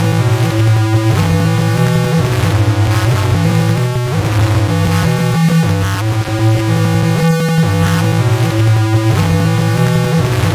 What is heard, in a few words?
Distorted Tones; Sonic Foundry (Sony Creative Software); Loop Noir